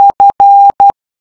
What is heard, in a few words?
Morse F